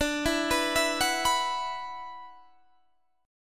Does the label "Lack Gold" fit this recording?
No